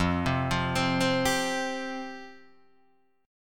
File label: F Suspended 2nd F Guitar DADGAD